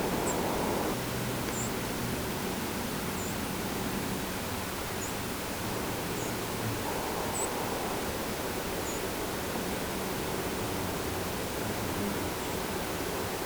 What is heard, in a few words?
Long tailed Duck